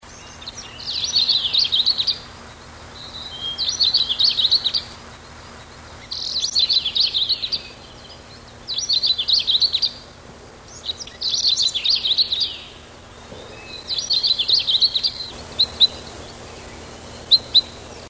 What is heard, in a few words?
Uragus; Урагус сибирский